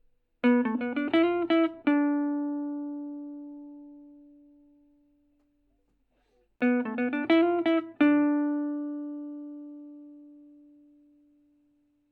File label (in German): melodie mix